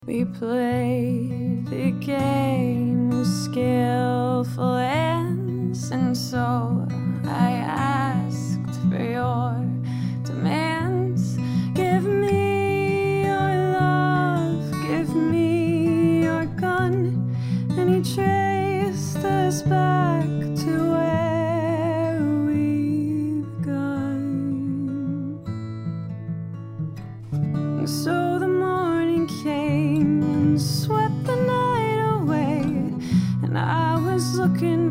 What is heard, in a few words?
singing music; Life is Strange 2; gamesoundopedia